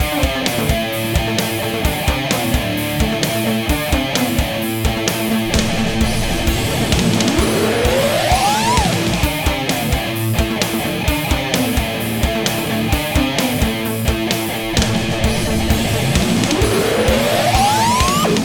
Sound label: Metal Euphoria Loop